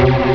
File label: SOUND HIT